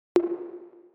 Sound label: Bop Button